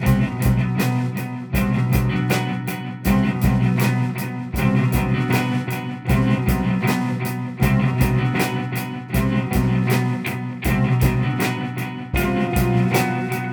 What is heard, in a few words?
basement New Drums Acoustic Bass Elect Guit; 3/4 bass